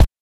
BANK1 BD, NES DPCM; MIDINES

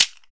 PRC WOLOF, Afro Shakers; Roland LCDP14 Africa VOL-2

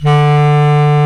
WND BS CLAR, Bs Clarinet, Orchestral Winds; Roland LCDP04 Orchestral Winds; Lo Clarinets

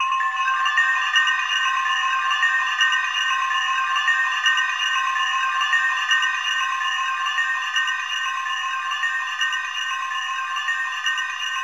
MAD BELL; AMG - Vince Clarke Lucky Bastard

